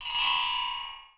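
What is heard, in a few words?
spinning, metallic fx; Voice Crystal; Steve Reid Definitive Percussion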